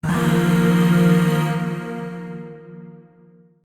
Shawzin Blues Chord; Warframe; Zariman Void Shawzin

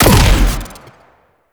Grenade Launcher; Thano Tech

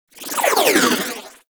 Igniter Pistol Proj By, Weapons; Warframe; Corpus

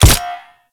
Rolling Latcher Embed; Warframe; Grineer

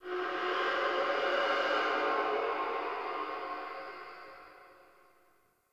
Pump Shotgun Reload Void Layer A; Weapons; Warframe; Zariman

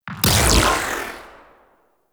Charged Tail, Weapons; Crp BFG

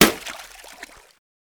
Water Balloon Impact

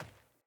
Sandals Run Dirt, Footsteps; Player

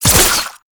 Flechette Whoosh; Warframe; Tenno; Oraxia

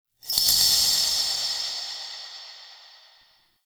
Interior Orbital Station Metal Shake; Warframe; Tau

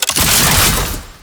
Necramech Activation Alarm; Warframe; Entrati; Nechramech Hack

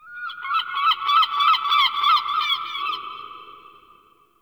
Bird Seagull; Ambience; Warframe; Eidolon Plains

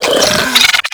infested biped alert, moa vocals; Warframe

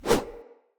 Grunt Foley Throw Whoosh; Enemy; Characters; Corrupted Sproutfolk

